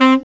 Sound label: SAX LICK, BRASS LICKS; Ueberschall; Jam Box